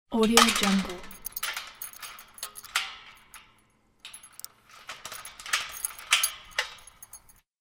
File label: locking thin metal fence gate; domestic sounds